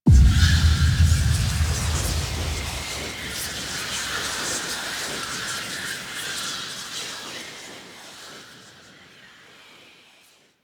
Weave Boss Death Pre Build; Warframe; Cephalon Weave

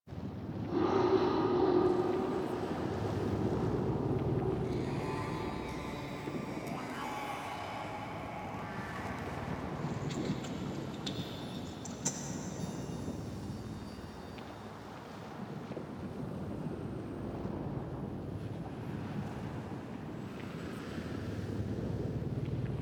Sentient Throne Room Ambience; The New War

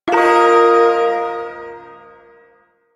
Shawzin Pent Min Chord; Warframe; Duviri Ersatz Shawzin

